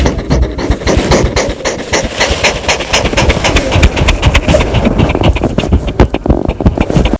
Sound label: cat sniff